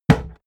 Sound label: hit wood quarterstaff medium